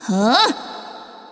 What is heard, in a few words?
attack; undead